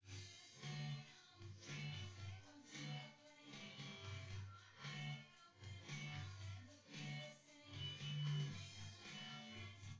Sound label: wdrums synthconv